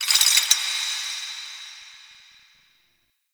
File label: Chains Small; Ambience; Warframe; Grineer; Fortress